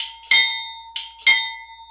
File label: klaxon, Train; F40PH-2 x3 13 Car Superliner Long Distance Pull